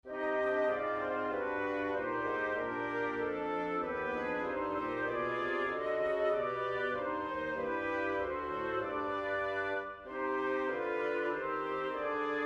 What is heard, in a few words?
A little song Woodwind Quartet